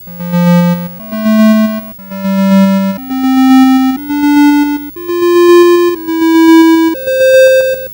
Atari 2600 Synth; 8-Bit; Chiptune

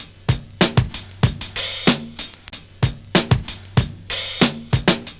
STRAIGHT BEAT, Kitch 70s; Univers Sons

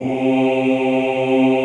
OOH C2, Classical Choir; Best Service; Extended Classical Choir

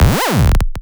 Scratching FX

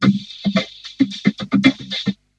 VOCO LOOP; Syntec; Wall of Sounds; TECHNO-BOX

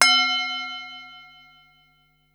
tibetan bowl, Yamaha Motif ES drum machine; 300 Drum Machines; Yamaha Motif ES-Series

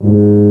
Tuba p G#1; Digital Sound Factory Virtuoso

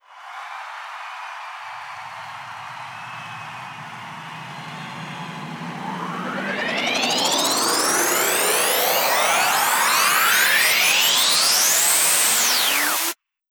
Fills 128BPM, Clubsounds

